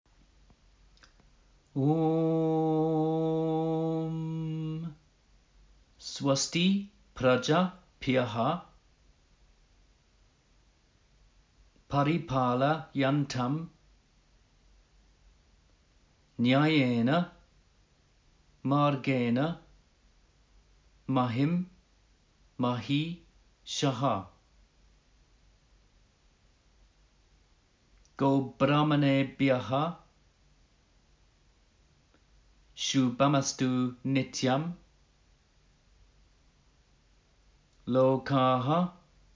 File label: Closing chant for beginners, yoga